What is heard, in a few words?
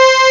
string hi; Epic Collection 3, The; Epic Marketing; muzak